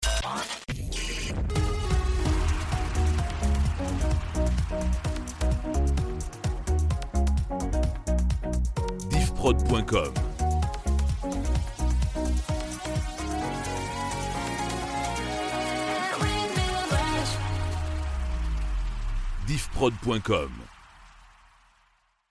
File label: Rampe Pop 129bpm